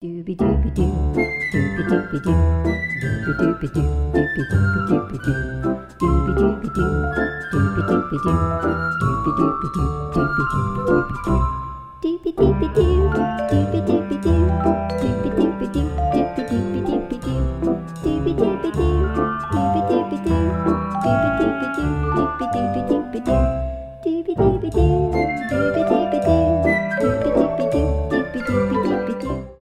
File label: happy song